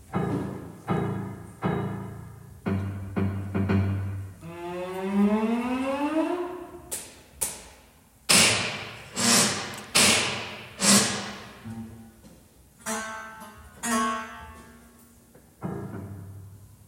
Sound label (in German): Geraeuschmusik Folter